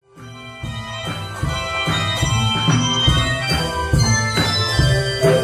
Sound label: jingle bells